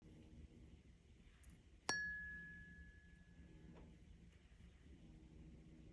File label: Crown Chakra Tuning Fork; tuning forks chakra color purple for crown